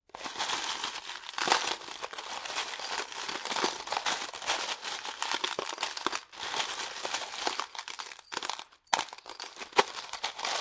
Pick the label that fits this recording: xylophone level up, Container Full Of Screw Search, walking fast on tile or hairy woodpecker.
Container Full Of Screw Search